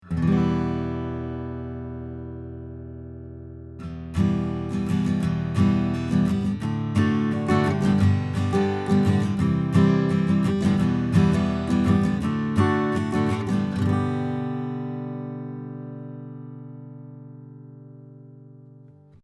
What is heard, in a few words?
Cardioid Strumming A